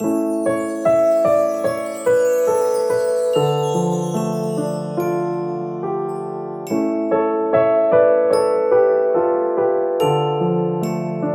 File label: Relax Loop